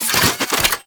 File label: Fist Spin; Enemies; Warframe; Tyl Regor